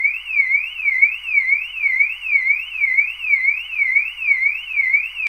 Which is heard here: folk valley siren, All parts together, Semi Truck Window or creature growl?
folk valley siren